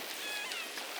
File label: pulse